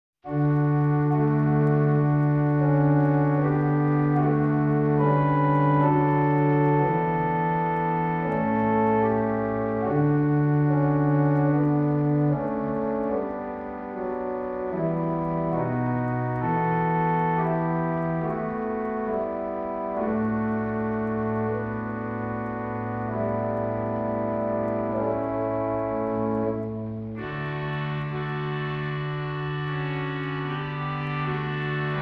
Chorale; Disney; Blanche Neige Et Les Sept Nains (Doublage de 1962)

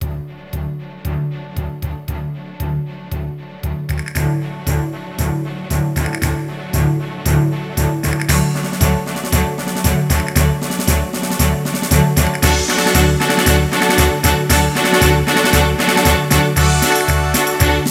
Instrumental

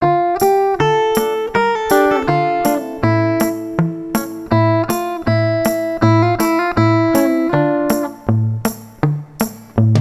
Chanter l'alphabet, Chanson

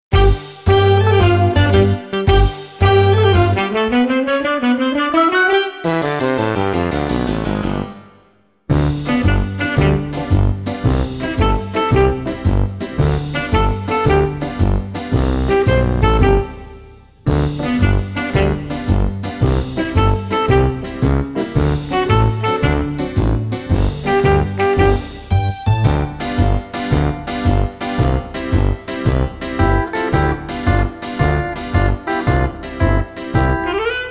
Le grand orchestre de Dorémi (Instrumental)

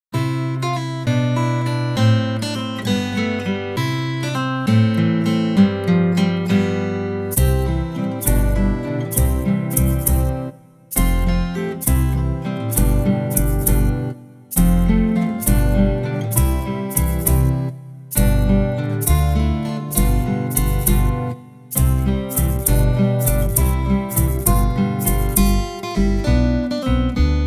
Les notes de musique (Instrumental)

